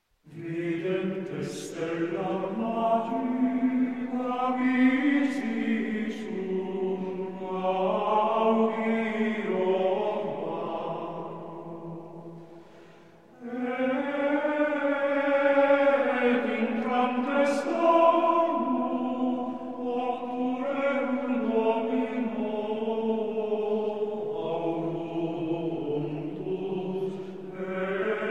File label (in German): Ambrosianischer Choral - Psallenda; Videntes stellam magi